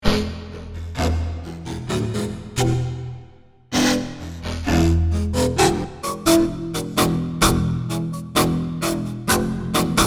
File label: Omnisphere Bow Orb